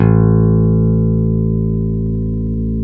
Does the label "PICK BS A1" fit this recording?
Yes